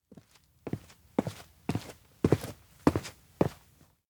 steps indoor enter; meguriai; chiharu; anemoi パッケージ版 1.1

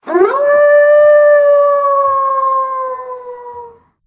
wolf; anemoi

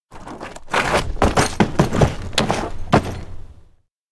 fall hard; anemoi

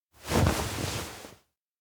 hug; rikka; anemoi

